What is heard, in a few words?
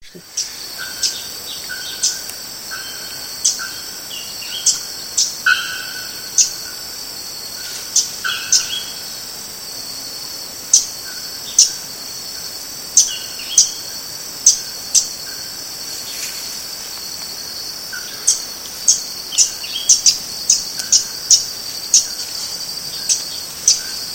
Phacellodomus erythrophthalmus, Mogi das Cruzes SP; sitio cachoeira